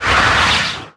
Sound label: Crush Strike; Qickskil